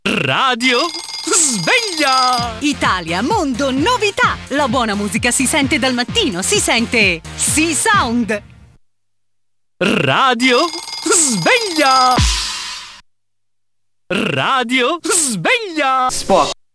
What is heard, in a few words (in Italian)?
RADIO SVEGLIA SEQUENZA